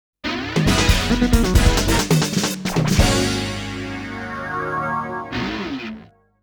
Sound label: JINGLE OF GOAL; Alpine Surfer; arcade